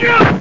Blow

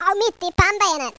oooww; Swedish

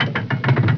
Digger; The Backyard

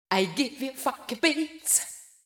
VOICE GRL HOUSE 125, House Voice

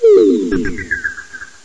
Foul, Pool

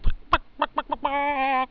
madman chicken; Hellboy

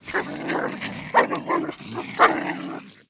DOG FIT; PC Gamer IT CD 40; zones; abandoned

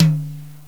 Jazz Tom; Drums; GMPlay; XG

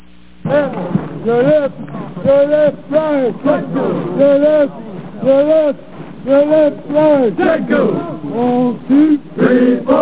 troop

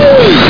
blast

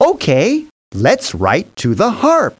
harp; SoftKey

